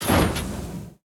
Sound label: Fire start